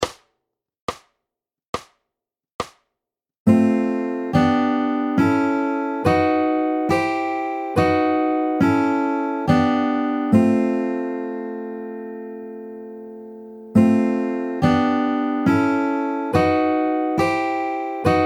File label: 3 FING, fingerpicking